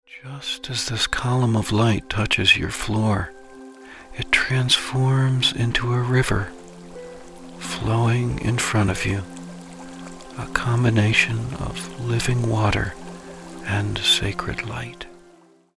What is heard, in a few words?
River